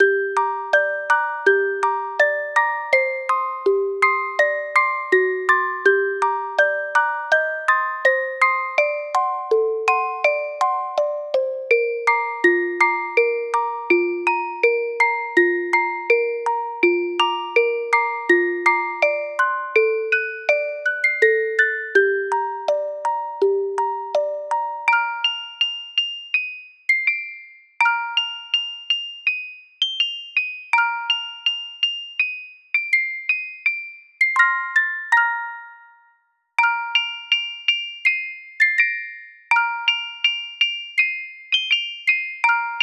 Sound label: Steppe (Music Box)